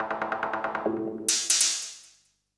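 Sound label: SNARE ELECTRO 140, Techno Drum; Techno2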